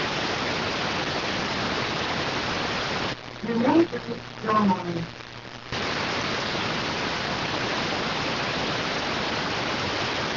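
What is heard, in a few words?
radioteletype receive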